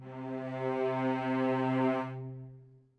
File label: cello leg C3, Strings; BBC